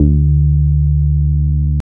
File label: BASS; SoundFactory